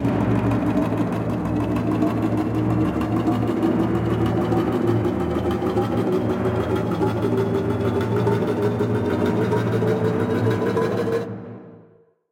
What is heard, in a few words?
near Win; wild turkey